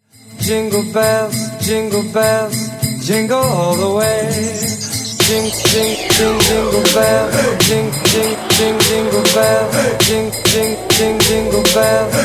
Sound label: Jingle Bells Mix